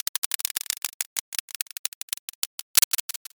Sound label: roulette